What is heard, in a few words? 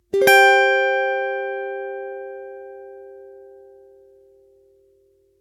ringing